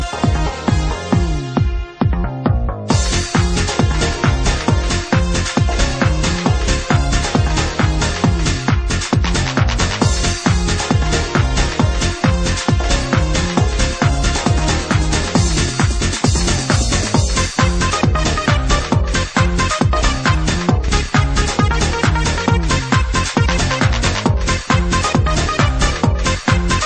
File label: Dj Techno